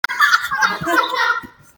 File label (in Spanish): risa